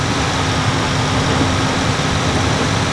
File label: fourth cruise, vehicles; fireengine